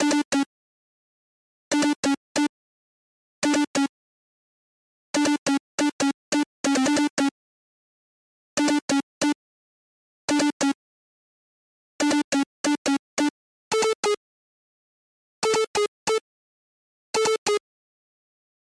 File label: Melody; chippy